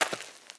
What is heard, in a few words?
Leopard Walk; Seer; GamigoNA